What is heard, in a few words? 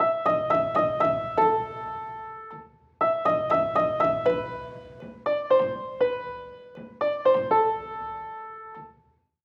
Piano Music